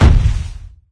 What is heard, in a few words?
tank walk, footsteps; player; source engine; left 4 dead 2